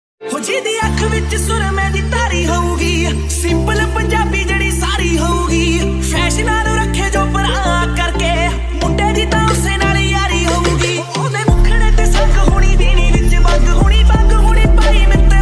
panjabi ringtone

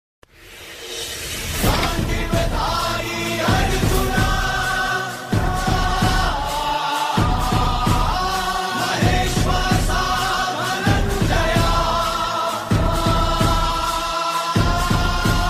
BGM ringtone, devotional ringtone; Mahabharat

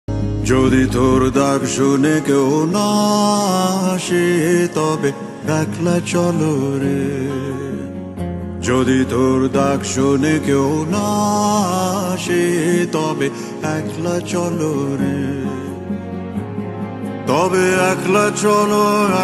bengali ringtone

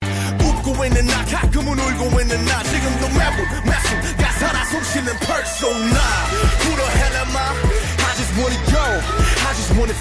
korean ringtone

